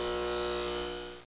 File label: sharp hum; Myst Demo; Myst Preview